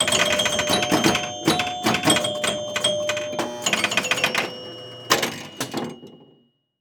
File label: Electronic Pinball Machine Rapid Fire Lose Ball; AdobeCC